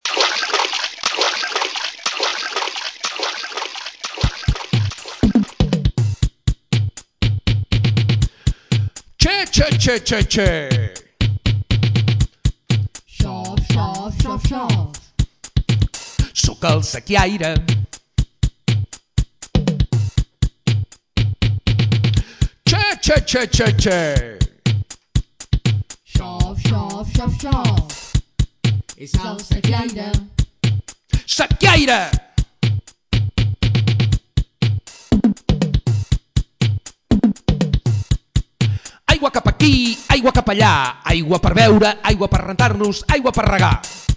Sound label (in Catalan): Rap del Sequiaire